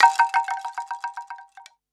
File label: bamboo; eddyflux